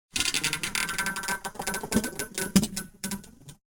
sci-fi metal destruction tone, vaporizing metal, cracking; Alexander Kopeikin; Sci-Fi Metal Elements HD